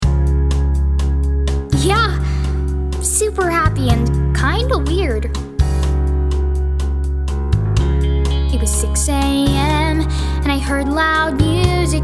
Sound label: VOCAL